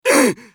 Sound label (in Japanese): 被ダメージ; グラシエルロ